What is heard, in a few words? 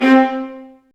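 Marcato, Strings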